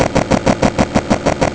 HELICOPT

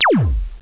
LASER; TERRA

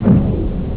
rotor; EXTREME

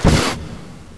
ROCKET

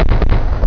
FLARE; VIETNAM

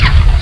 lase

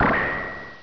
CLICK

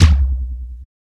hit, zombie

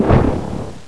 missile

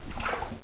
WET STEP; TYRAN

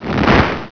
MACE

